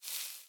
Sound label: sizzle; World Of Goo; Fuse